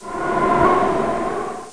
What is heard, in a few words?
Vent